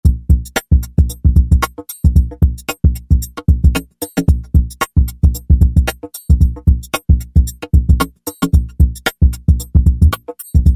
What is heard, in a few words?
FM KIT, Roland V-Synth; Factory Patches